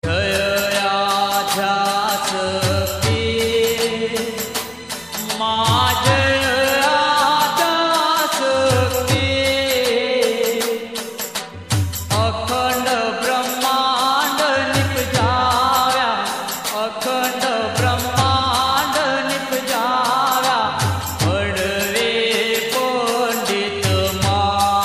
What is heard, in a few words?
ambe aarti; Navratri Special